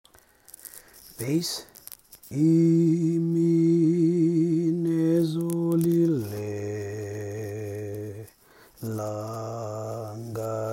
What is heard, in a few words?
bass